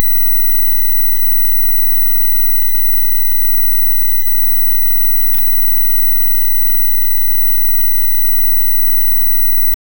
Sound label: tv bad word bleep, censored